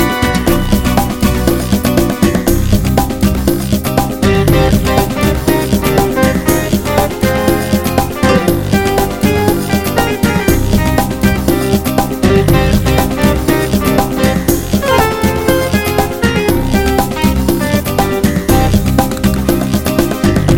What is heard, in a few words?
Happy Minor Music